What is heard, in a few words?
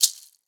shaker jungle